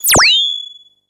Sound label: ricochet; One Shot FX; Sample Magic; Transmission-X